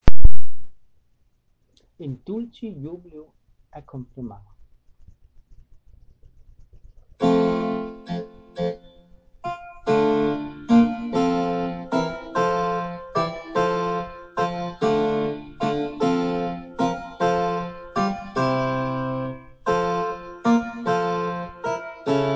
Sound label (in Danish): akkompagnement på spinet